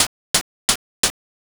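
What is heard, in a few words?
footsteps poolside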